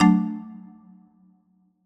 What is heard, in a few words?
desktop login; stereo